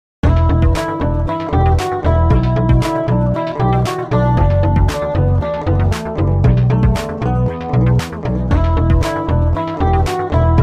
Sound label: New Mobile Ringtone